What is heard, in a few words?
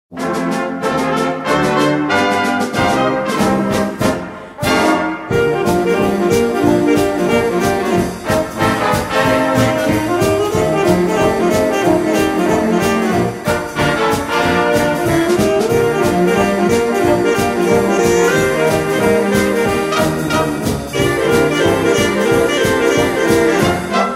Sax Swingers